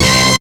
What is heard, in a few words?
DIRT HIT, STAB; SoundFactory; SMPMANIA